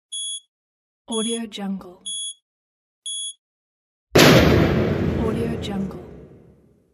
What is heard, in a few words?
time bomb